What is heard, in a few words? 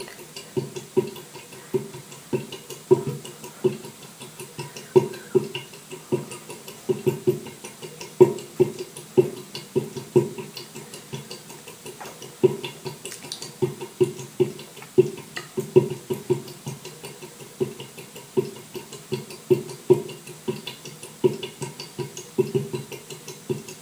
Musical Toilet